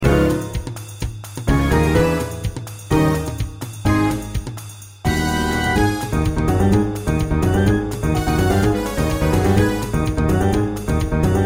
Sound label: Accompaniment